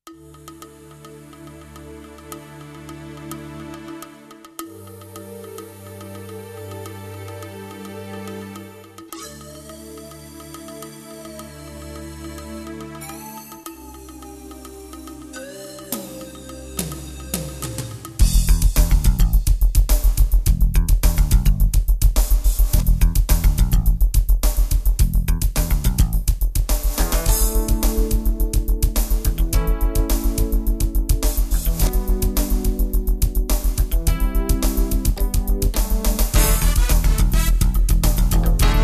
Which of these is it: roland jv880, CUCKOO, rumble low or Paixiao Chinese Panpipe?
roland jv880